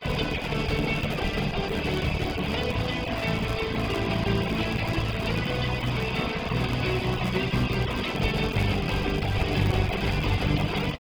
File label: groove soft